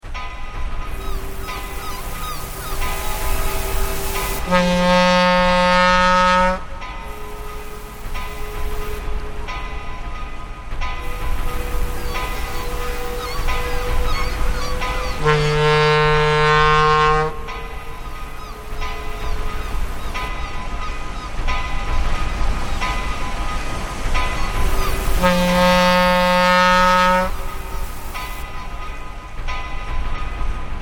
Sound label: Shipbuilding Dock, Atmospheric sound of Shipbuilding Industry by the sea with waves, seagu; Construction; Atmospheric sound of Shipbuilding Industry by the sea with waves, seagulls and ship horns